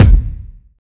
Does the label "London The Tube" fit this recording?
No